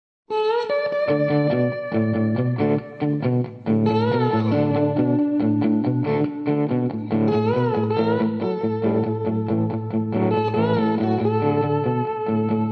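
little gem MK2, Strat - Little Gem MkII - 2x12 cab - mic - sound card (software reverb)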